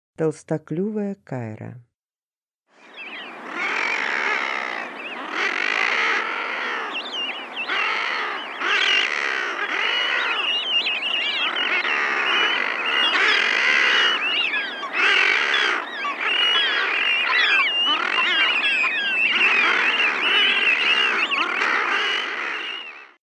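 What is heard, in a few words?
thick-billed murre voice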